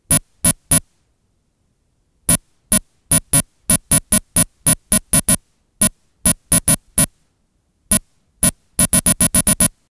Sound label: tape data